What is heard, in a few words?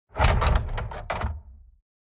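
Door open close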